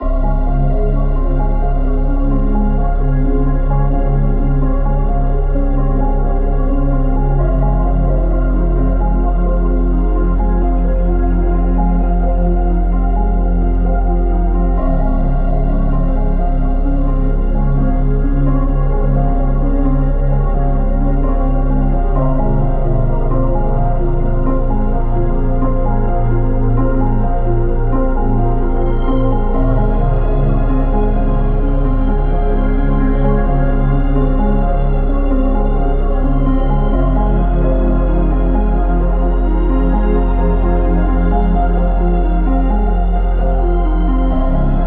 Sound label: Ambient; Ambient Vol 4 Music Pack